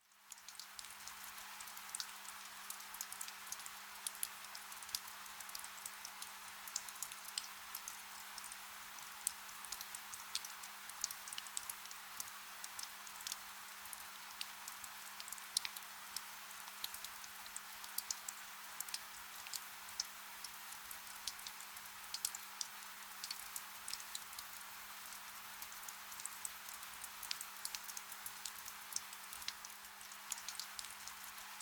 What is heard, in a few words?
ambience rain